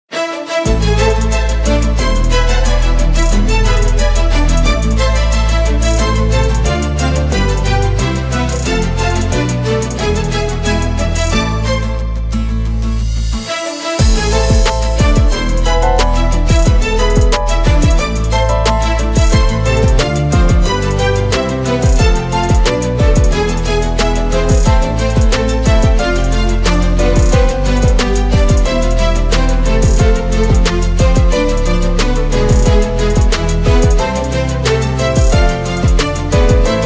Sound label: violin; white records; the bach era